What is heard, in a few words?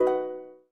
Harp Lock; Pantech; Discover P9090